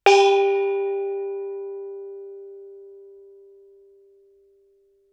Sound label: thai gong ff G4; MIS Pitches - 2014; Percussion